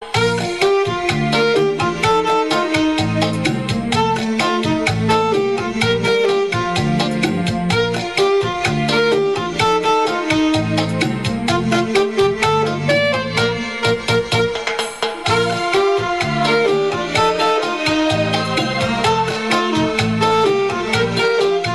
cha cha cha

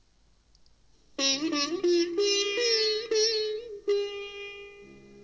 Morning Raga

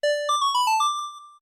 NoteBook Sound

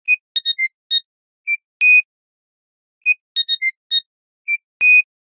Classic SMS Nokia

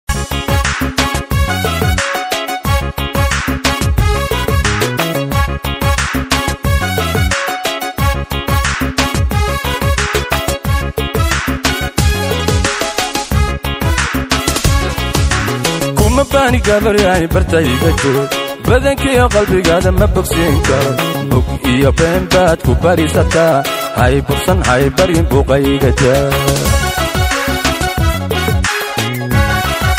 Somali music

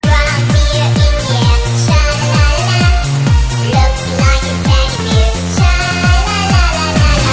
Teddy Bear Techno

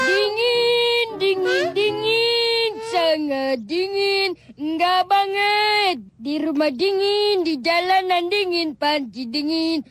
Dingiiiin

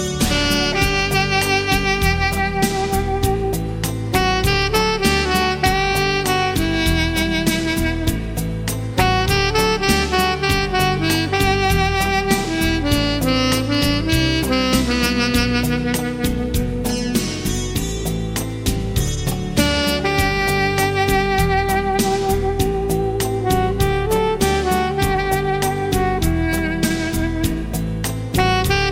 saxophon

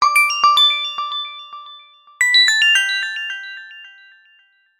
Ring-a-ding

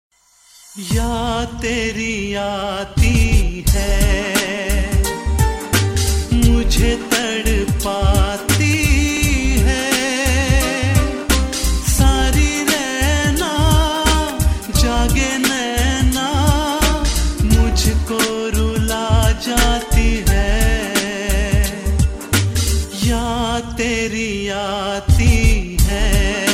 Sad Remix

